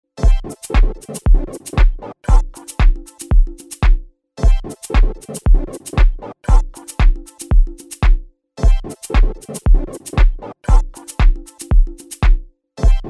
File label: Step Dance BPM100